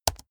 keyboard laptop space press fast hard

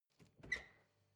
refrigerator door open squeak

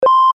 future communicator signal

